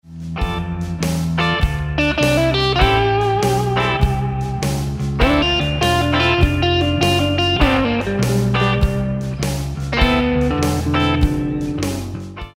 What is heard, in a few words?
Reconsider Lick, guitar